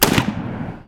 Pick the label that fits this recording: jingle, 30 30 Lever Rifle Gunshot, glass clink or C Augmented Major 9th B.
30 30 Lever Rifle Gunshot